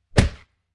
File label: fist punch or kick